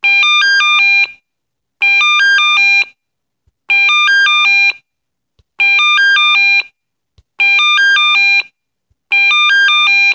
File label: Pager Tone